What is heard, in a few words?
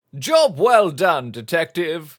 success; Pitch Black - A Detective's Demise